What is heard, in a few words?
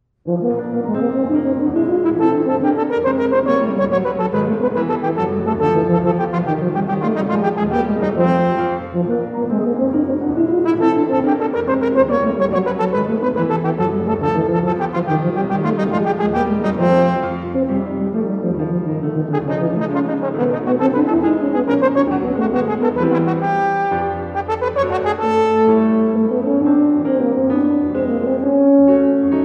Divertimento for Horn, Tuba and Piano - Molto Allegro